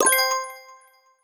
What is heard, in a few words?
Notification